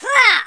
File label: pain; alienarena; invader